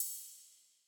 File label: Drum Loop, 98 BPM Crash; Loop Stems; Cymatics; Vibes II Drum Loop Collection